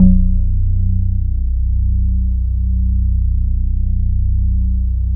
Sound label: TRANCE, Bass Analog & Synth; USB Soundscan vol.12; AKAI